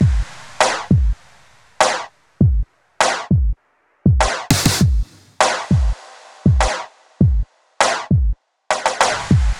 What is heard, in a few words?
100 Beat; Zero-G - Future Wave